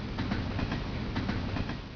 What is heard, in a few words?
TRAIN; dopewars